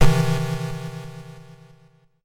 ショック (PSG版)

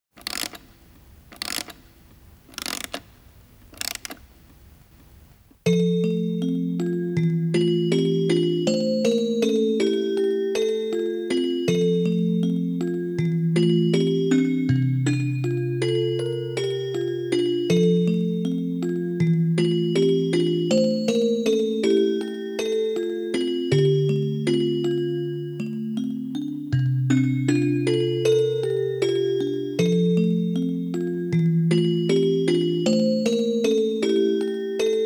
オルゴール; Ikinari Happy Bell Sound Party

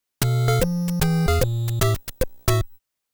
ジングル B

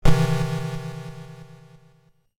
ショック PSG版; fray msx remastered soundtracks 2008

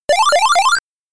ハイスコア更新ファンファーレ

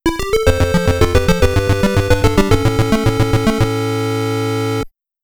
ゲームオーバー

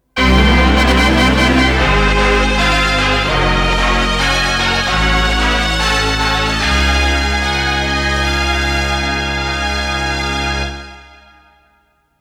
Tekunosu fanfaare; Downtown Nekketsu Baseball Monogatari

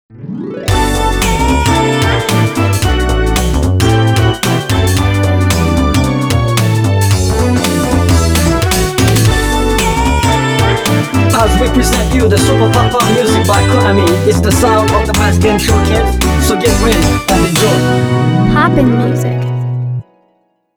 タイトルジングル; pop'n music 9

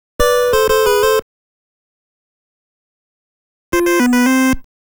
正解 不正解; 地底大陸オルドーラ

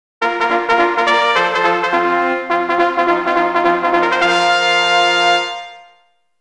とてもヤル気のファンファーレ; Monster Farm 1 2

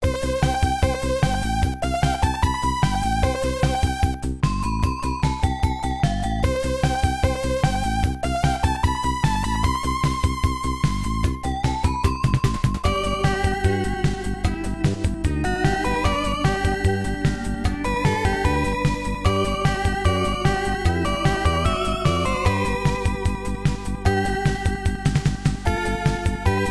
メインBGM1 FM音源版; fray msx remastered soundtracks 2008

